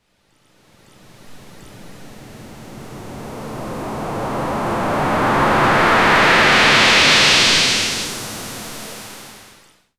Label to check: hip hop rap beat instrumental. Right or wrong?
Wrong